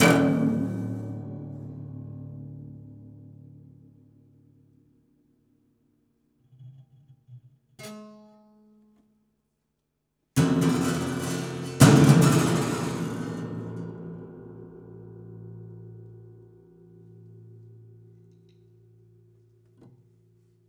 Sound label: Plier Pluck, Piano; Piano Destruction